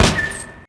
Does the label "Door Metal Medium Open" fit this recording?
Yes